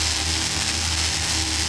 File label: beam loop; Shadowman; baddys; tflegion